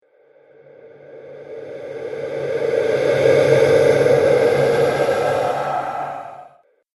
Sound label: Pyramid Phantom, ghost sound effects